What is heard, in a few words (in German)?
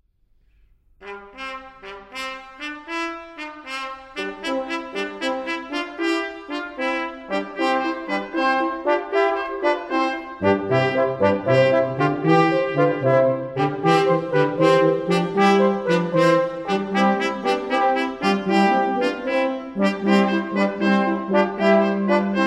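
Blech Trara es tönt wie Jagdgesang Kanon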